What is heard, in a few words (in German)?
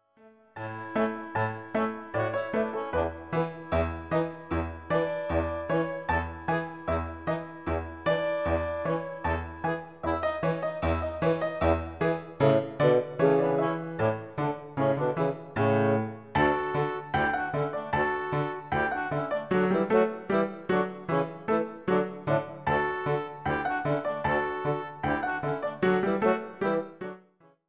musette